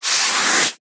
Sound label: office sponge brush single stroke on paper long; zapsplat